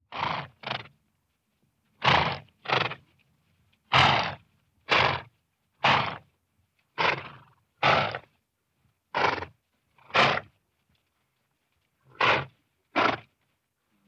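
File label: horse snorting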